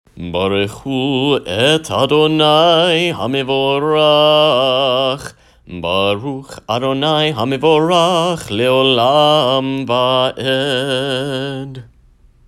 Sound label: Barchu Chanted